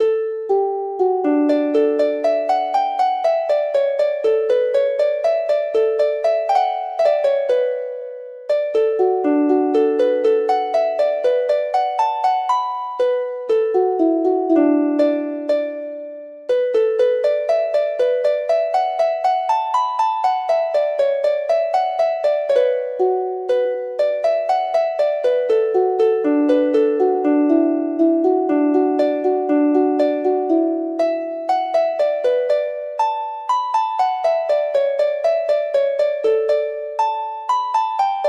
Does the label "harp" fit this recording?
Yes